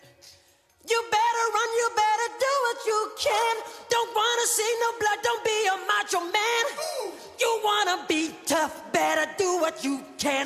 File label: Isolated Vocal Track